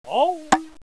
Musica Error

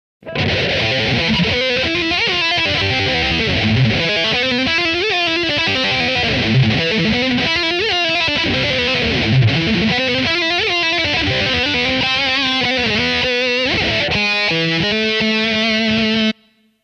Hammer on Lick With Slides; Mick Thomson

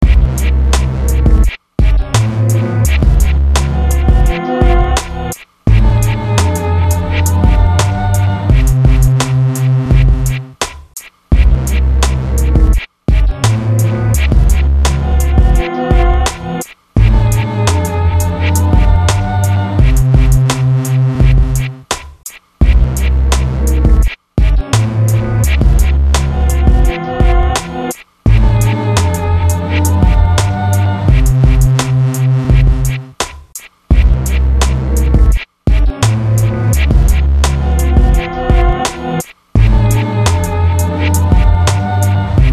junglist hip hop instrumental; EXPERIMENTAL HIP-HOP

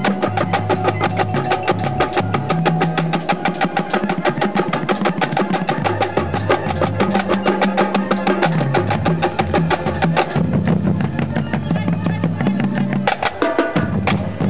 rumba, drums

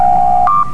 door chime; startrek

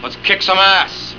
KICK; Let's kick some ass!; Trading Places

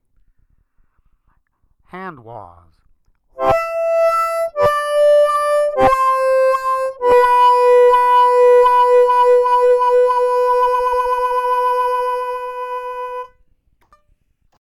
Hand Wahs

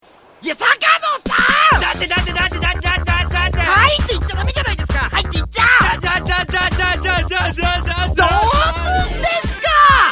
eeps

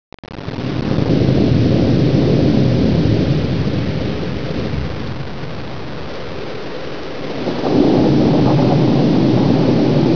Thunder

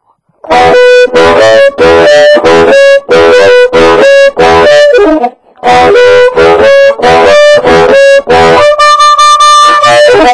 clean, harmonica

